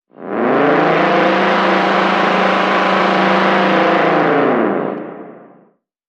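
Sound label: Creature Nasty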